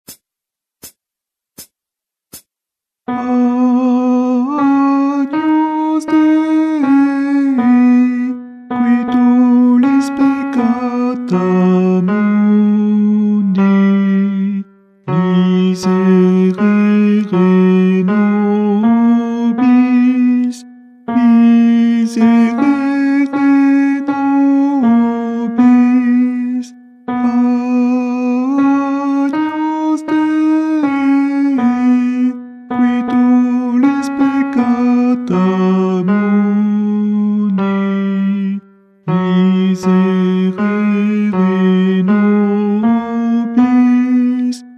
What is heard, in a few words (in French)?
Messe de St Paul Agnus Guide chant Ténor